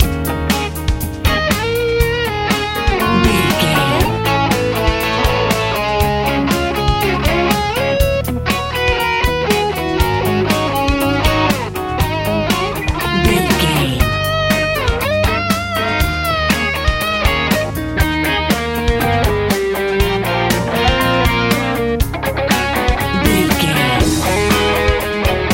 easy pop rock

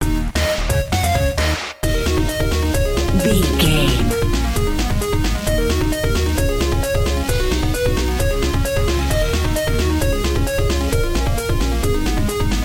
big boss battle video game loop

